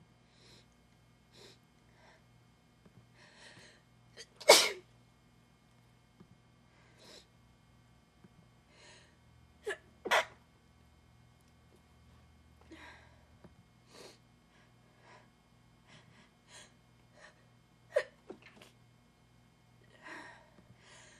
hard to stifle, female

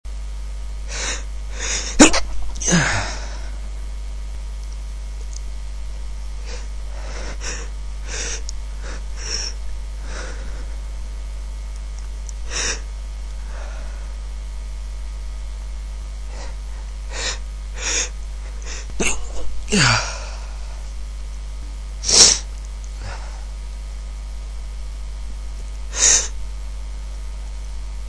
trying to stifle, male

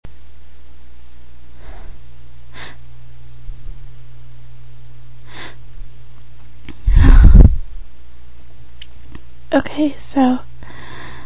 stifles, female

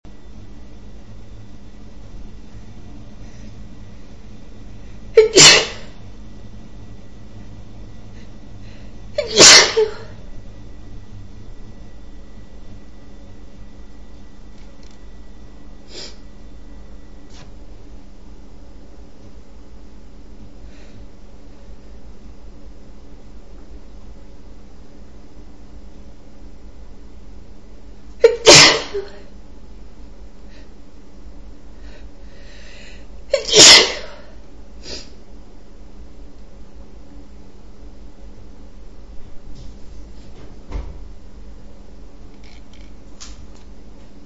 softly, female

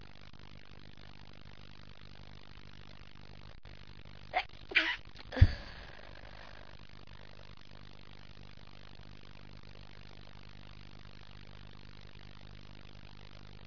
stifles, female

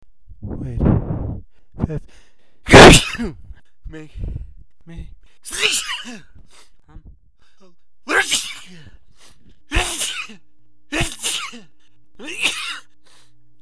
short fun, male

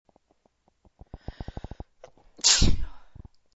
sneezes once, female

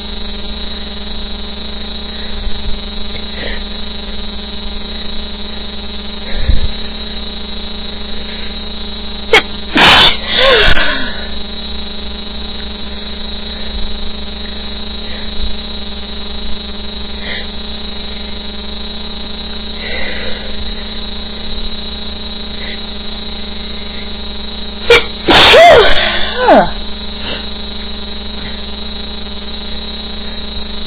tried stifle, female

